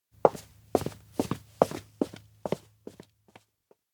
steps indoor go back; meguriai; fumiya; anemoi パッケージ版 1.1

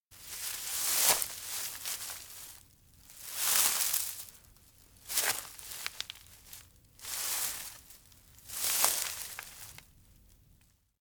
steps grass stealth; anemoi

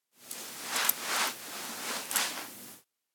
dog rub leg; anemoi

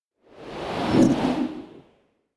elec pizza dough air; anemoi

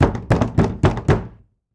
Knock vigorously; anemoi

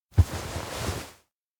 carry; awayuki; anemoi

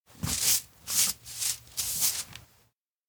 sweep clean broom; anemoi

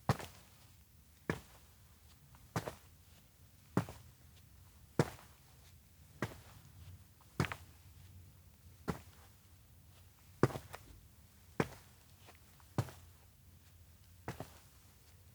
steps outdoor walk loop; anemoi

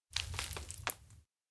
tears drop; awayuki; anemoi

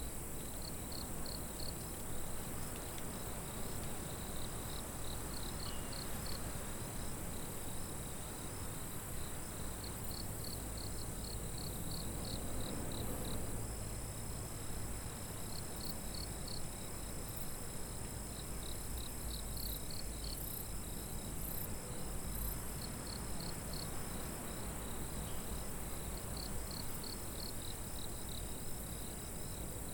night outdoor insects; anemoi

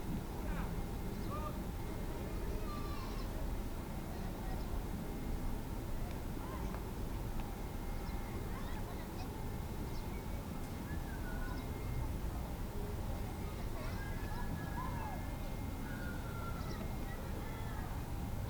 indoor school; anemoi

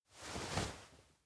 hand on shoulder; aino

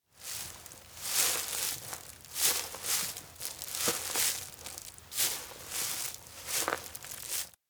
steps outdoor grassland walk few; anemoi

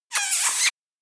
elec whistle kiss two; anemoi

